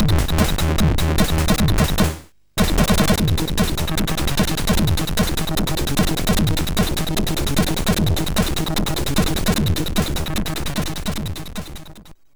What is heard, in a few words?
Catch Me, MOS6581R3; Early Tunes